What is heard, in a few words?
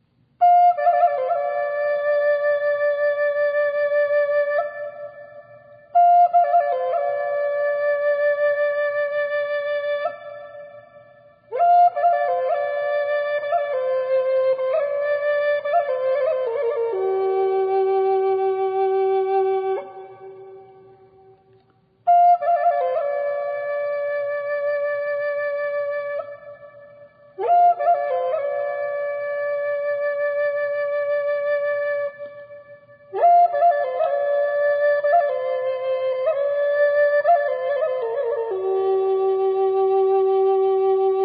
FLUTE